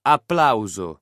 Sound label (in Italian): applauso